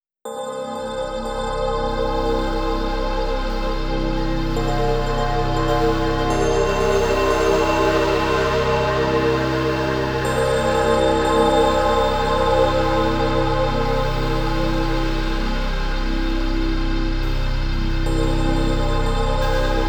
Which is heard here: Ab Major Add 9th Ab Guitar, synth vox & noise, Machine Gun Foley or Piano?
synth vox & noise